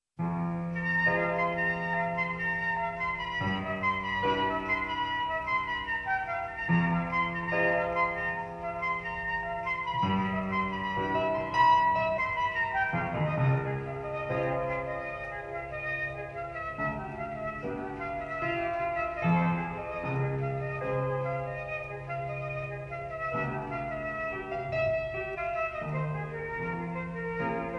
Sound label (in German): Floetensuite II 2. Satz